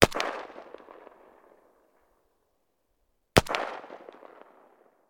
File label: gun ak 47; still north media